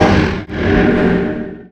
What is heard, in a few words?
dissimilate portal; void spirit